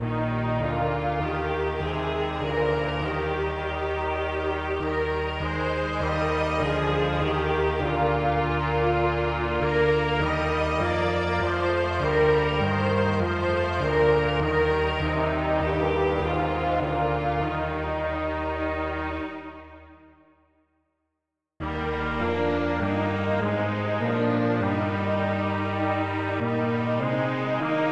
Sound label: polyinstru; hymns; Himnario grande